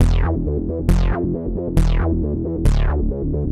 MatrixBass 136 E; musicradar; bass boutique

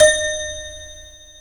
D#4 TOY piano; AKAI S6000 CD-ROM - Volume 1

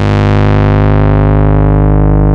TAURUS A2, synthesizer

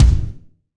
Power Kick; Roland BK-5

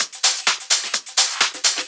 Discohouse Loop 128 BPM

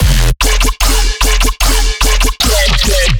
bass loop classic pattern 150 E; Virtual Riot

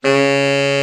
TENOR, Tenor Sax; Tenor Sax 1

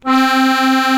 AKORDEON C 2, ACCORDION

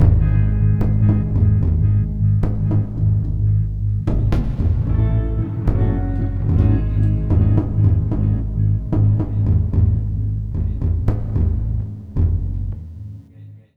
Full Mix, G#; Zero-G Kocktail Kollection; Construction Kitz (Lounge); Volcano at Sunrise 074

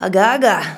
"agaga", shouts; Best Service; Hyper Dance

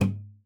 STOP, GUITAR FX; Xsample VOL-2

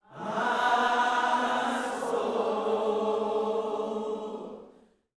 MY SOUL, Vocal Planet Gospel; Spectrasonics; GOSP PHR

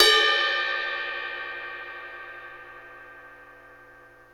Bell Ride; KJ Sawka; Drum n Bass & Beyond; Drum Tool Box

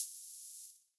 HH, Dance Mega Drums; Best Service; HH HOU

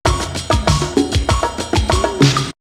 Percussion; AMG - Coldcut's Kleptomania VOL-1

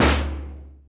BANG, Impacts, Hits & Booms; Distored Reality

